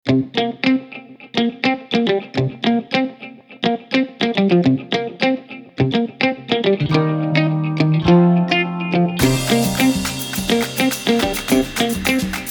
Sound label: funk short, Fitness